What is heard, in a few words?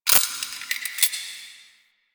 Sparks; Warframe; Quests; TheNewWar; Ambience; Zariman